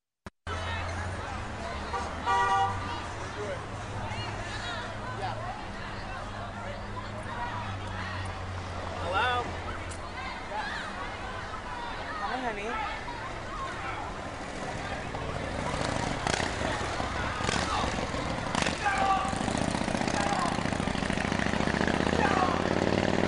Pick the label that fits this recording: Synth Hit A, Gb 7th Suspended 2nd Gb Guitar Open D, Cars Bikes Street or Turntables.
Cars Bikes Street